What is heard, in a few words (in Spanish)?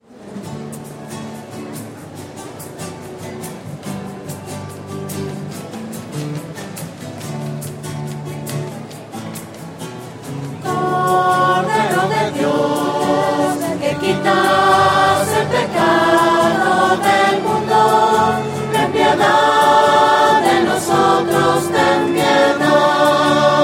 voces; misas 2012